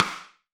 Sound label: RIM MIX, AMBIENCE RIM